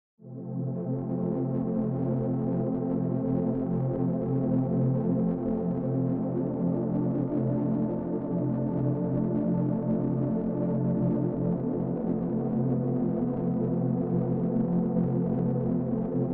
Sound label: pad with mix 70